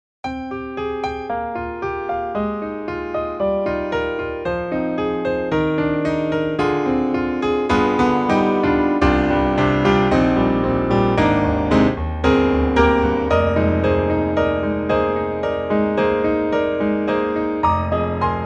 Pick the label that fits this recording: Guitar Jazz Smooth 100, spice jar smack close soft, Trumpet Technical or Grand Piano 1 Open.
Grand Piano 1 Open